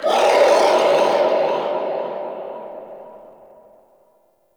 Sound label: Ghost Death, Vocals; Enemy; Nightfold Test Range Ghosts